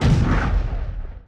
mine found; Pirates; treasure quest

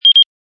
homing powerup; holidays; snowbrawl

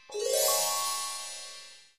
charm present; princess

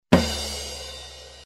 crash; disney; mashup

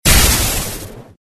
pickup speed; disneyxd; stuntastic

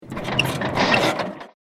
Rev; Fred; Cars

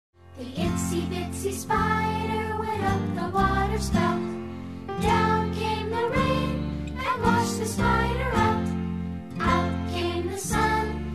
karaoke instr activity medley; childrens favorite songs; disney

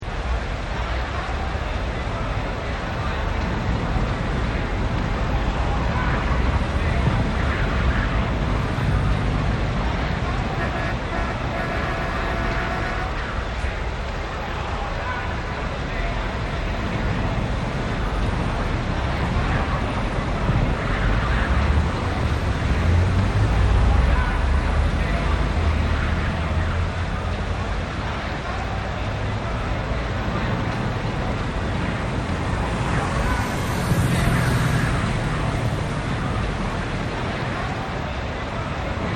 ambient master; the wild

